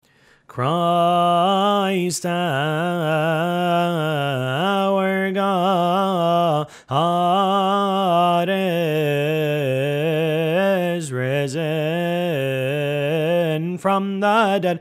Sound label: verses of cymbals